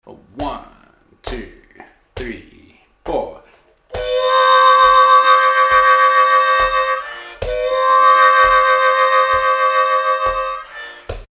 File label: Lick, harmonica